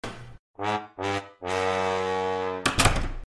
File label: incidental trombone